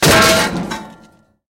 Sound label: bollard crash; tanks; War Thunder